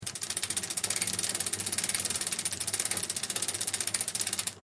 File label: Bike Chain Spin Moderate; Bicycle Sound Effects; Chain Sounds; LYN103 package of Chain Sounds